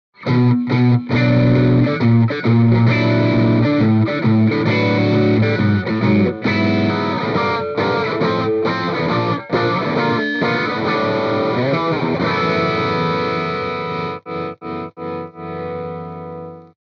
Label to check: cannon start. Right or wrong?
Wrong